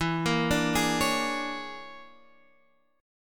A sharp 7th Sharp 9th Flat 5th E

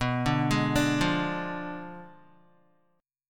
B Diminished 7th B Guitar; Bdim7 arpeggiated; 6,5,8,7,5,x; JGuitar

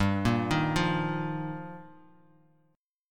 G Minor 7th Sharp 5th, Bass Standard; Gm7#5; arpeggiated; JGuitar

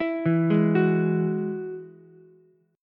E Major Add 9th E down Ukulele C6; arpeggiated

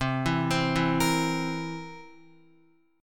C 7th C down Guitar 4F, 4A, 5C, 5G, 5C, 6E; C7 arpeggiated

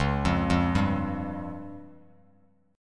C sharp Major Flat 5th, Bass Standard; C#Mb5 arpeggiated; JGuitar

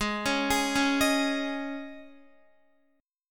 G sharp Suspended 4th Guitar chord, down; arpeggiated; 4F,4A,5C,5G,5C,6E

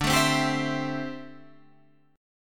D 9th Suspended 4th D down Guitar Standard; strummed; JGuitar

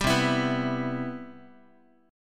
C Major 7th Guitar; CM7; strummed; 10,8,7,9,x,x; 4A,4E,5A,5D,5G#,6C#

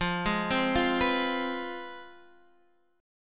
C Major 7th Suspended 4th Sharp 5th, guitar

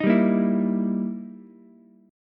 E Minor Major 7th Flat 5th, E, down, Ukulele

